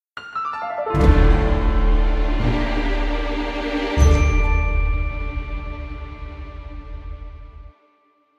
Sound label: Fanfare